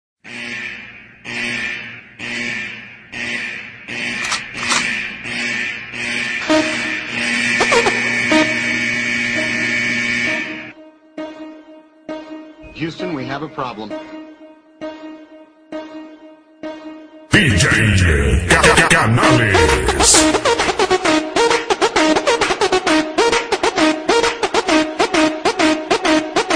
new tribal ringtone

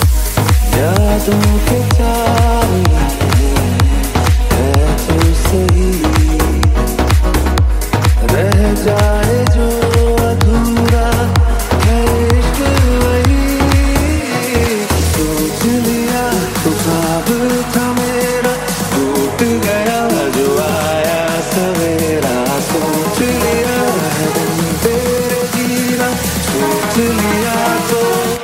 remix hindi ringtone; radhe; bollywood ringtones

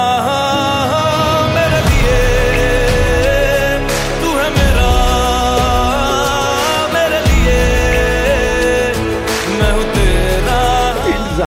hindi ringtone, bollywood ringtones

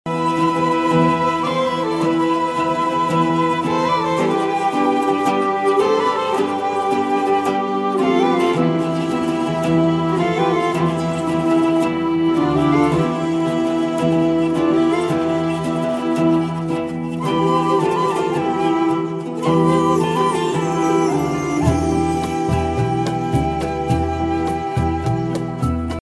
new kanun flute ringtone; new kanun flute vol2

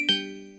xiaomi 12x notification ringtone

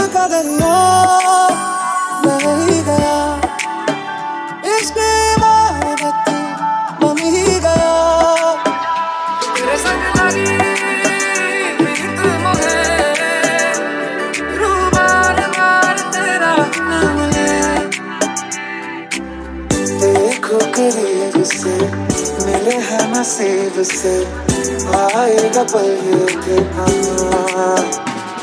bollywood real music ringtone

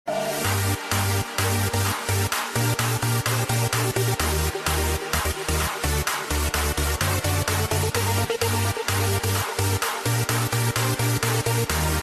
electro house music

